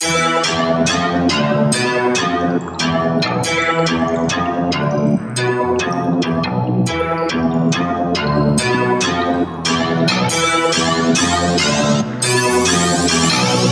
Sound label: Riddim Melodic Loop - E 140; Sludge; Ghosthack; Riddim Essentials Volume 2